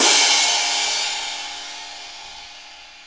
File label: hitsound percussion; cstrike; tf2